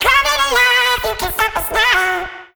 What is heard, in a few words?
phrase F#m, Vocal; Slate Digital Omnivox Vocal Sample Pack